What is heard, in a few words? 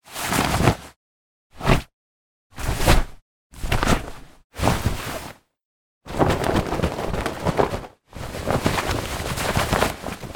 denim jeans foley; zapsplat